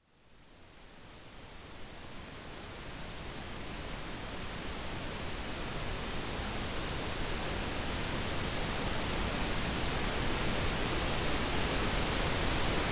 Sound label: Pink Noise - Relaxation; RobinHood's AS Sessions